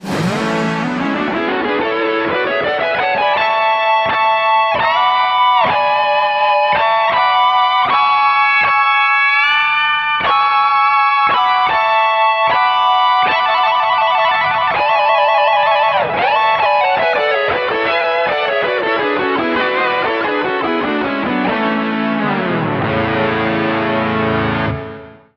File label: Intelligent Harmony Triad 2nd Inversion